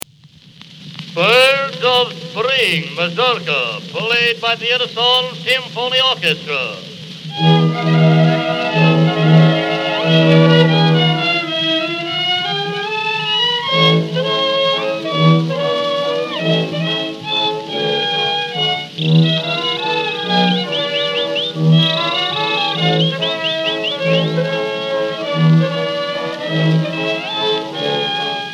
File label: birds of spring Orchestra; Edison Symphony Orchestra; 1904; cylinders; acoustic